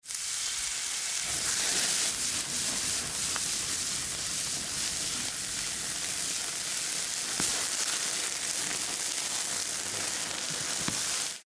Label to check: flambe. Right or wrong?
Right